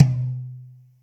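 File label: TOM soft; Zero-G; Chemical Beats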